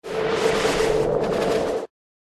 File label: Air Spirit Stun